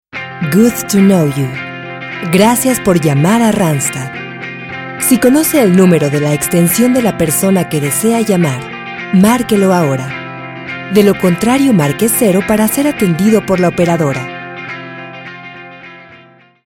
MUSICA; RANDSTAD

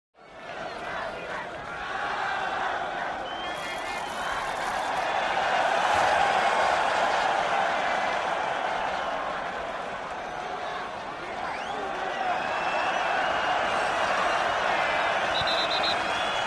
futbol 35 000 espectadores; efectos

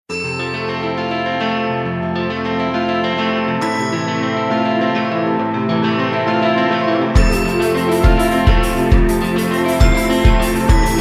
Corporativo, Musica

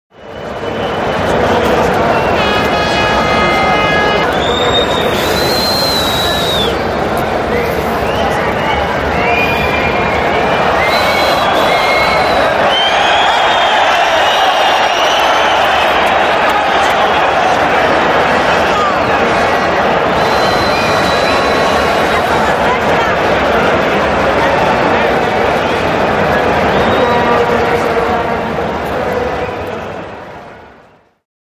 futbol ambiente grada; efectos

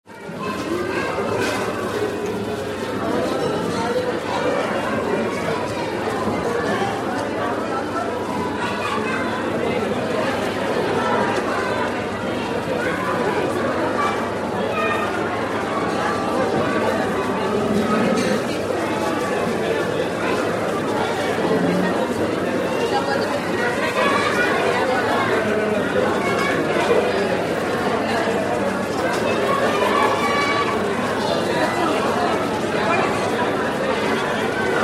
restaurante comida rapida; efectos

